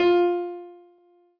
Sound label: Piano Ff